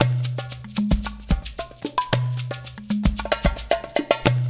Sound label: LOOP 2 R, Percussion; InVision Interactive; GRUNT